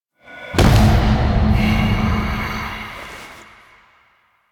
landing vent cutscene; Warframe; Stalker

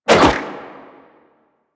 Golden Band Staff Spin Whoosh A; Warframe; Monkey King

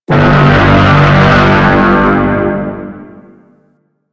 Turret Detect; Warframe; Duviri; Duviri Turrets

